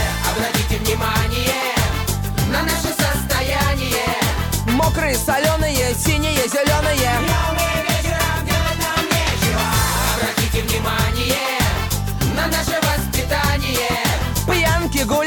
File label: Loop; AttentionPls